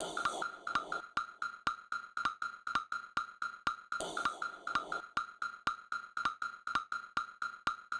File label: Loop 120, Perc Loops